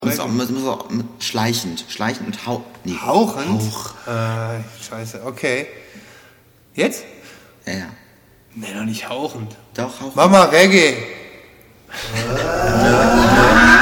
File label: Creepy